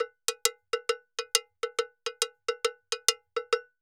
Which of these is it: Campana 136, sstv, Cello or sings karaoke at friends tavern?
Campana 136